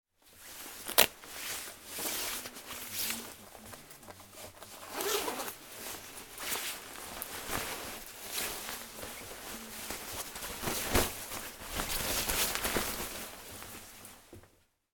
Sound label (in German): jacke; zoom h6